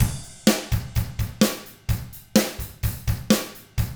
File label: 127 SURF ROCK